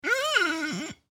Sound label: Finger Squeak, Finger Squeak On Ceramic Plate; Wet Finger Squeak On Ceramic Plate , Medium Speed; Squeaks; Objects